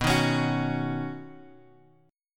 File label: B Major Add 9th B Guitar Open F; strummed; 11,10,13,13,x,x